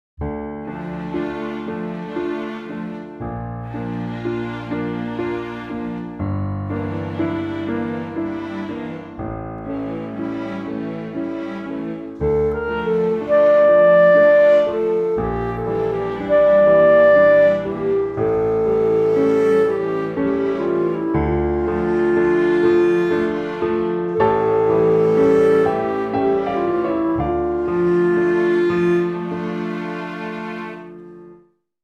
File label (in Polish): Schubert Serenada tempo wolne